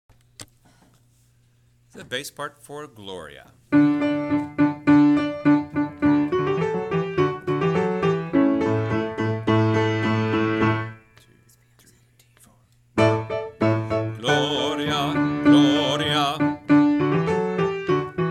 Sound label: Bass